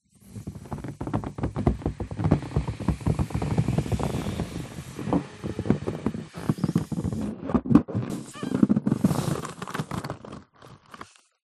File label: balloon deflate slow rubber creak squeak; foley